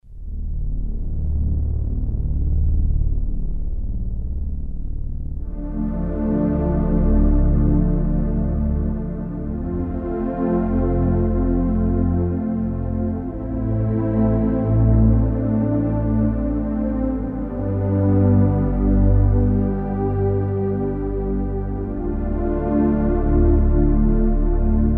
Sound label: Pad, Kurzweil K2000; Pad Nine; Kurzweil K2000 Value Pack; Extra Programs; ORCH1X